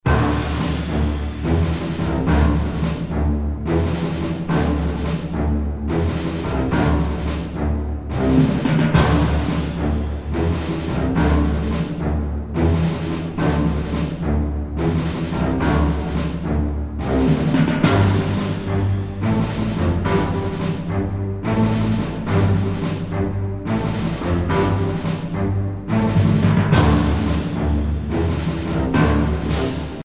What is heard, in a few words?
Confident; bridgecommander